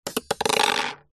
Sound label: industrial spring small down on wood table